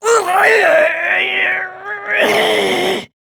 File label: Death; Warframe; Shik Tal; Battle Barks; en